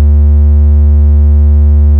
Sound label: triangle